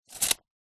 industrial tape measure metal retract into plastic reel